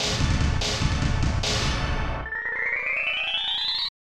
Earned Bonus

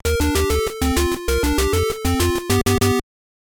Correct Answer; double dare nes

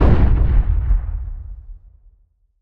Flashbang Explode Distant; Wepons; Counter-Strike: Global Offensive

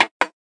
TIMER

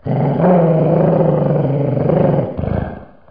Dog Growl Behind Wall; Counter-Strike: Global Offensive

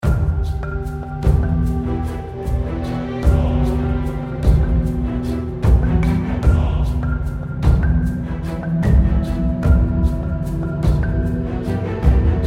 Battle BGM; magic rush heroes; android

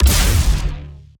Lasrgun Ballista Fire Long

